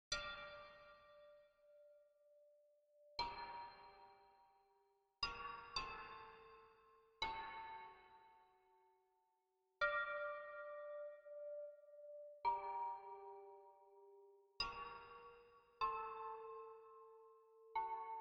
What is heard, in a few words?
bell hit